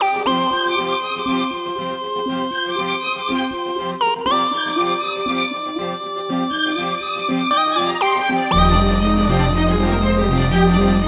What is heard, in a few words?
boss section forward loop 120bpm; chicory a colorful tale; boss4 section02